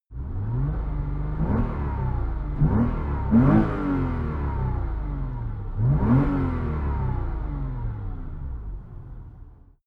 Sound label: sports car porsche boxster rev interior; verkehr transport; autos